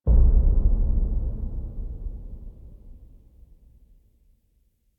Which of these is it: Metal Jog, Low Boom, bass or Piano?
Low Boom